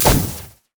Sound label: bandage toss; LoL; badrobotamumu